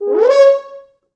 4 FrHorns FXMOD, C#4; East West; Quantum Leap Horns Sax

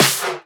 Claps; Vengeance; Essential Clubsounds Vol.4

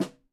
Snare, EMU PX-7 Drum Machines; 300 Drum Machines

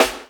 SD, Dance Mega Drums; Best Service; SD-HIP

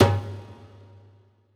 Dumbek; Essential Percussion

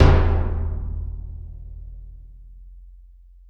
HYBRID, Heart of Africa; Spectrasonics; AF.HYBRID

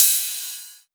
Rides, Cymbals

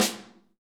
SN ambient; Best Service; Real Mega Drums VOL-1

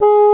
WINDS & BRASS

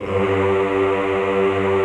EHH G1, Classical Choir; Best Service

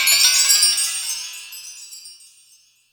Wet Metal; Yamaha DTXpress; Effect 1

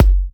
F#, Kicks; markus hakala

